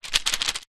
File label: seed pod shake flamboyant tree; foley